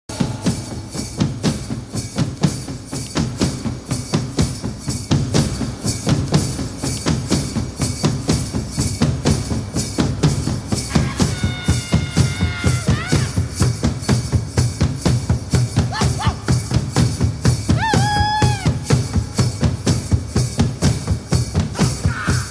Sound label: drum